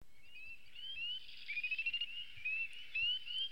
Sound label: northern spring peeper